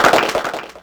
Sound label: Clapbox Pitch 3; Ableton Foundation FreePacks